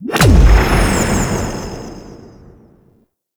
Antimatter Portal Open; Warframe; Nineteeen Ninety Nine; Deep Archimedia

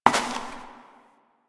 Step Perforated Metal; Warframe; Koumei

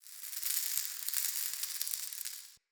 Cave Dirt Trickle

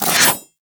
Melee Whoosh; Warframe; Corpus; Arachnoid Hunger; Abilities

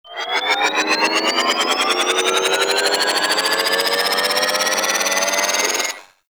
Anchor Rewind Loop; Orbital Station; Warframe; Tau

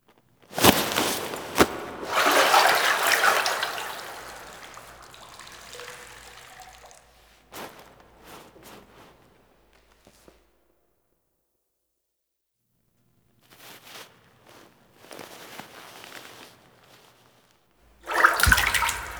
Foley; Warframe; Tau; Cinematics; DarkRefractory

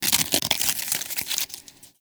Armadillo Foley Scales Roll; Warframe; Characters; Hunting Critters

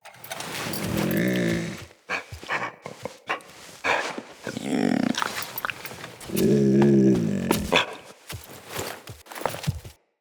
Wolf Cub Pet Standing; Cinematics; Orengall Quest

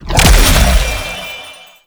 Treasure Box Clone Spawn; Warframe; ManInTheWall

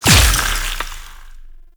Impact Large; Weapons; Warframe; Duviri; Incarnon Adapters

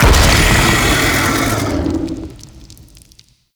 Burrow Exit; Gameplay; Golden Maw; Warframe; The War Within

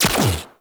Turret 3D Fire; Weapons; Warframe; RailJack; Corpus Crew Ship

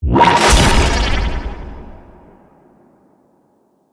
Insect Med Station Spawn; Liset; Liset Powers

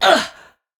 Pain; Warframe; Tau; Dax; Ninja

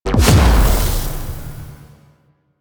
Alt Proj Explosion, Blastor Pistol; Warframe; Weapons; Corpus; Plinx

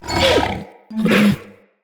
Corrupted Battle Dog Stun Idle, Animals, Vocals

